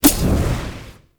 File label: Sticky Bomb Throw; Grineer; Veilbreaker